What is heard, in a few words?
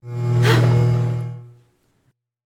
girl spin; astro Legends Lyra and Erion Desktop; Foxium